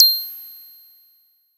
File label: SY SQUEAK; ModelCycles